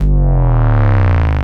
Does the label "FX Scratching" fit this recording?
Yes